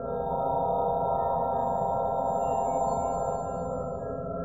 Keep, ambience

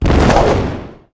Mineral Damage; bullet

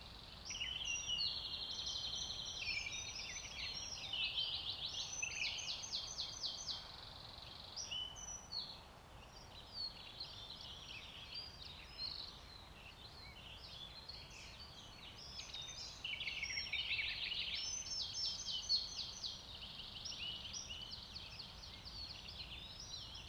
Tree Bird, ambience

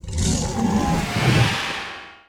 WAR POWERUP; TWEEZER; Mob

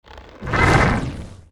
WAR ATTACK; GRAVELAPIS

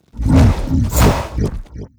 WAR ATTACK

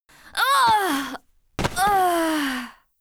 die; Despreado; Mob

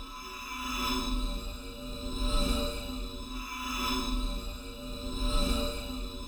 Machine; ambience

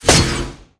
Bsword Metal, Damage

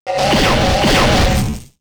Attack

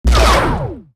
beamgun; Weapon

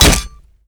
Staff Shell, Damage

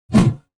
beam axe; Weapon

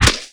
Arrow Skin, Damage

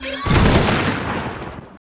Attack; Kwelersokum; Mob

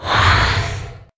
circle flame

